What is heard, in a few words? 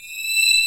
LINEAR SYNTH